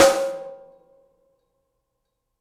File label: Snare Shard; ZeroGravity Drum Shards